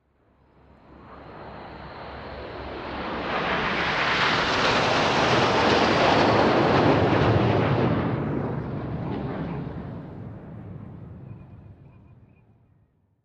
Plane Hawk Far OH Jet

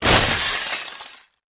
Glass Wood

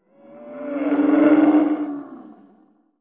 Animal Stinger Bull; stingers & whooshes

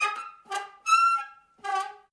Wood Squeaks

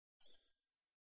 Elect Chirp Shimme, multimedia element

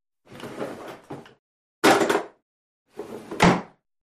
Bathroom Drawer, drawers

